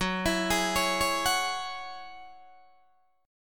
Gb 7th Suspended 2nd Gb Guitar Open D

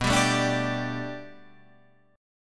B-Minor 7th Sharp 5th B down; Bm7#5 strummed

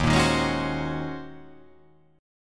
Eb Minor 13th, Ebm13 chord; strummed; JGuitar

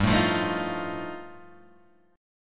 G 7th Suspended 2nd Sharp 5th G chord

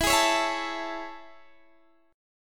A Major 7th E

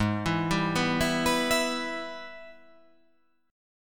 Minor Major 7th Sharp 5th; Gsharp

